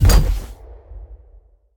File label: giant run; smite; vamana